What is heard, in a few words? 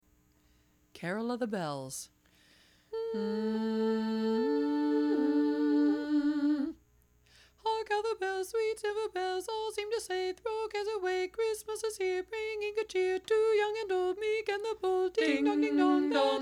Carol Bells lead missing; Bells lead omit